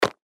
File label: baseball glove catch